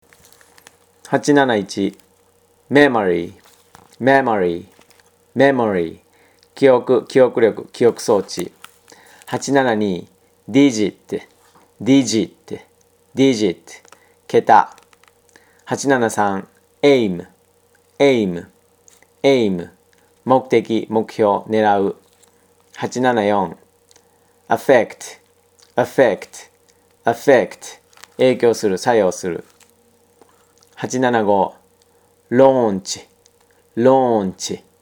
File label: tango